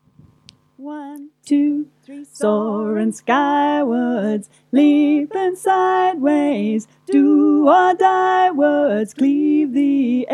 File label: Unison in Harmony harmony, Folk Choir